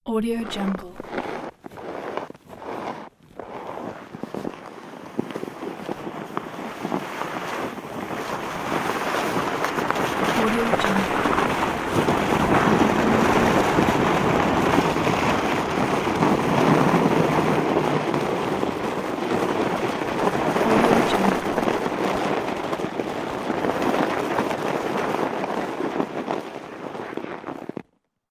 sled going

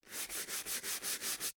scrub floor brushing friction scratching

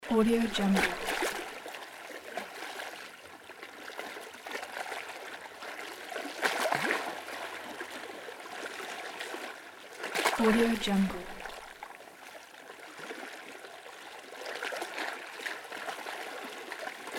ocean waves gentle; justsoundeffects

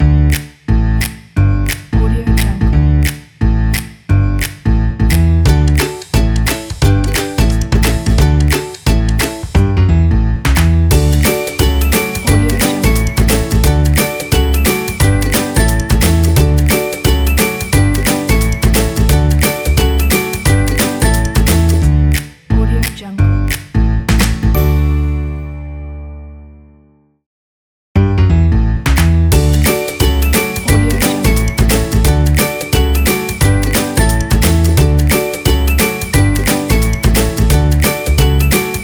the funny travel logo

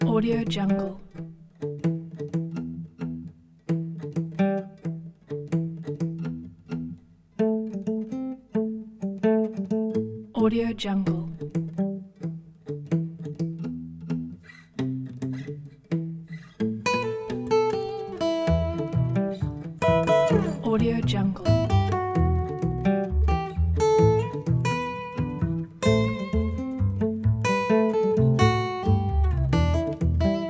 acoustic rock; eddiehoncha

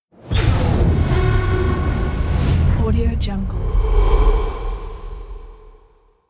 ice and fire intro logo

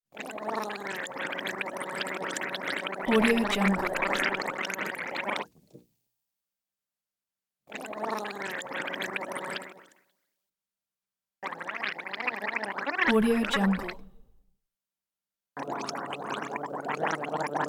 vocal gargle comic bubbly gurgling cute

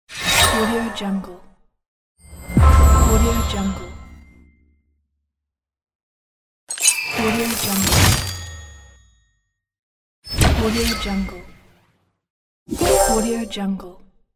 cash win pack

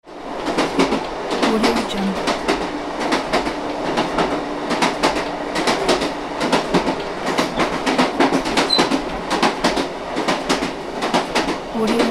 interior railway carriage

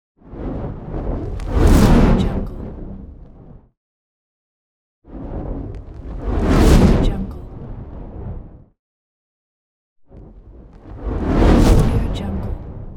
large flaming whoosh; bestsfx

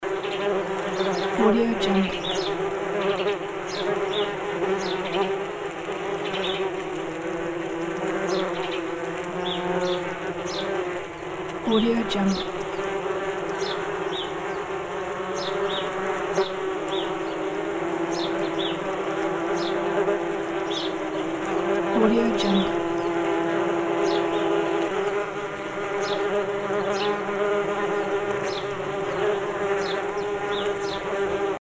wasps loop

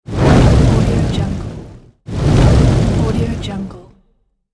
fireball

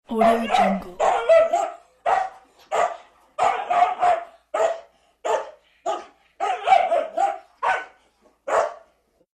little dog bark

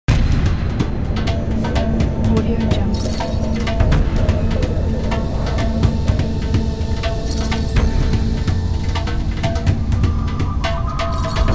suspense dark mystery trailer

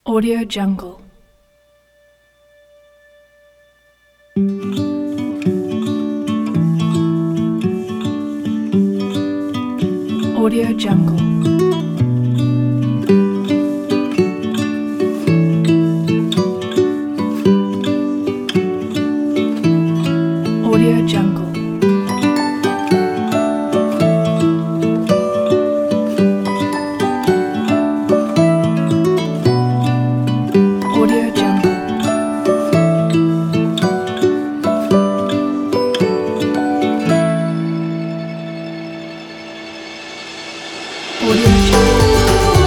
road trip folk pack

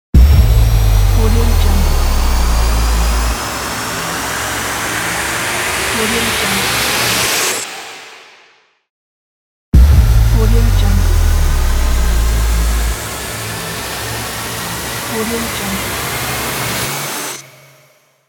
riser; phillipmariani

